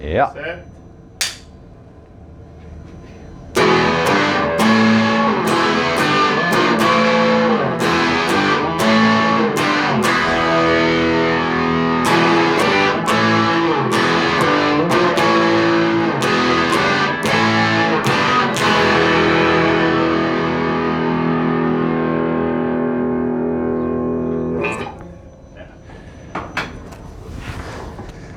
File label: electric guitar